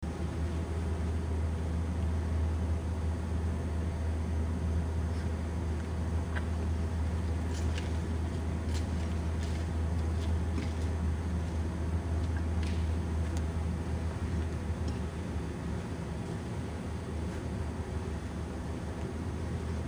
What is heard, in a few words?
HUMREC, Brummton